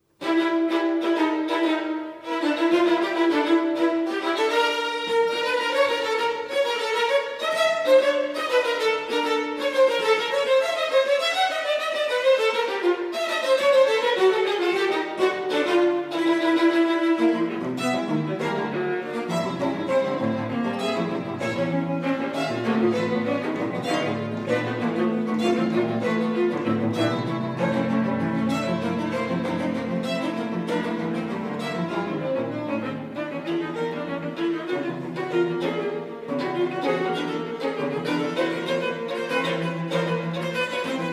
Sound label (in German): Streichquartett in zwei Saetzen, 1. Satz